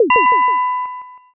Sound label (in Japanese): shishiodoshi